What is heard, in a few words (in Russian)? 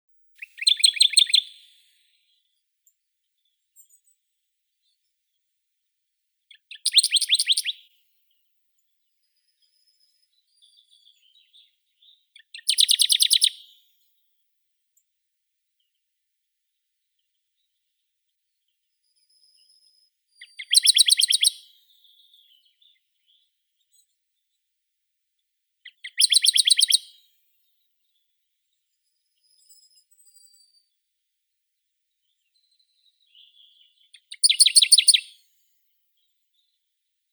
kamishovka tolstokluvaya acrocephalus aedon